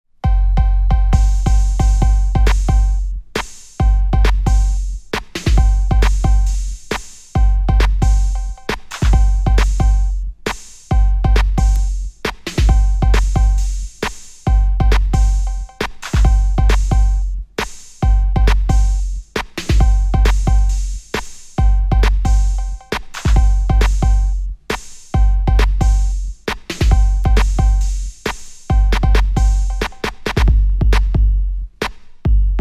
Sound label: Bonus Beats